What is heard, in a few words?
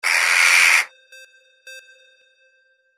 CROW